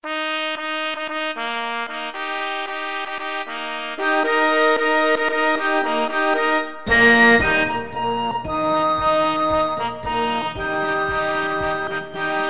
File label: New Escort